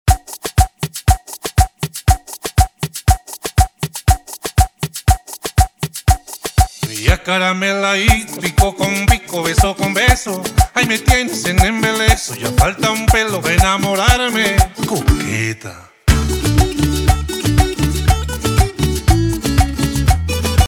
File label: remix intro 120 BPM